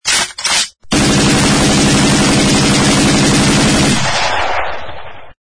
gun

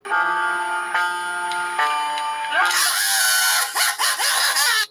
jumpscare; goon inc

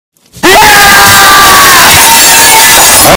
screaming; mr noisy

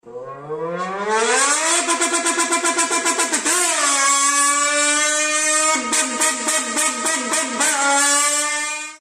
motor

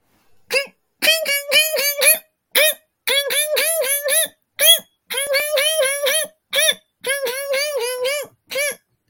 goofy laugh

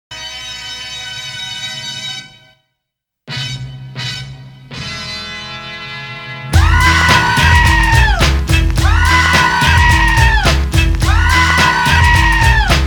scream around

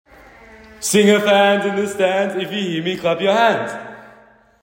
fans

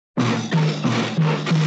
stomping object into the ground

